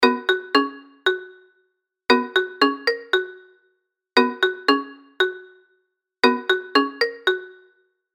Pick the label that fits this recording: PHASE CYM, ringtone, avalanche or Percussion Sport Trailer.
ringtone